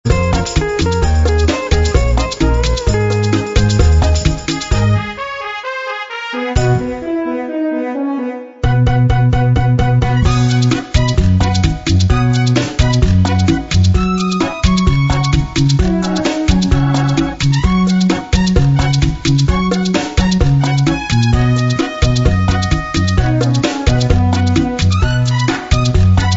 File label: Cha Cha; bg-music